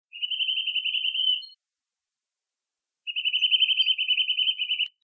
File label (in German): kleiber